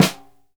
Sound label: SNR, Synclavier Percussion 2 - World & Orchestral; ILIO